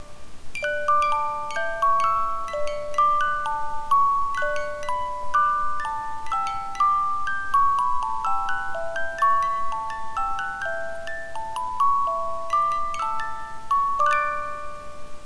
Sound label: Music Box